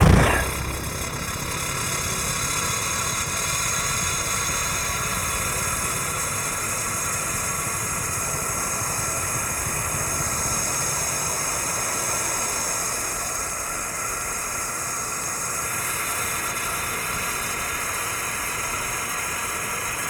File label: Steam Engine Start Idle Off, Trains; Vehicles